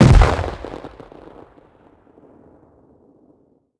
expl close, explosion; World of Tanks Blitz

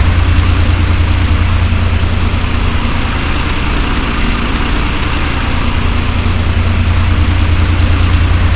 stuka side, engines; War Thunder

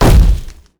impact log heavy

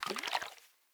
water bottle slosh; Rust

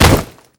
foley generic slam wall

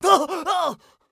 scav death, voice; Escape from Tarkov; scav1

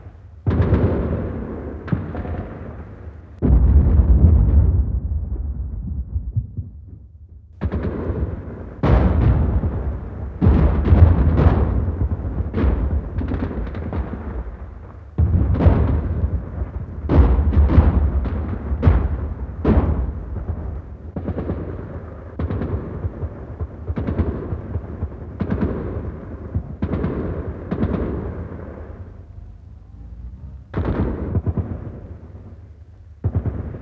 Amb Destruction; Red Orchestra Ostfront 41-45

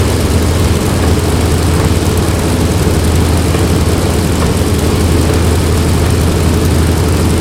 c46 side, engines; War Thunder

